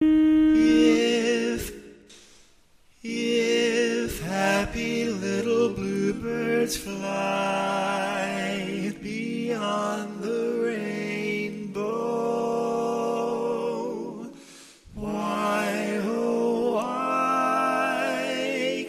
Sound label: Lead, barbershop tags